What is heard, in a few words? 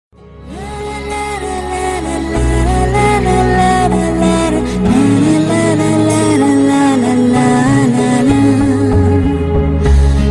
ringtone; children